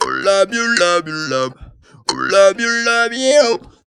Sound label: LOVE LOVE LOVE, Funky Vocals; USB Soundscan vol.18; AKAI